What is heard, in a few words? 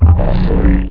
armor rot, items